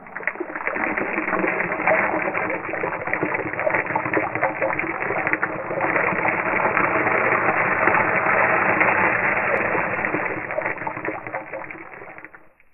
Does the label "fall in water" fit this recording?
Yes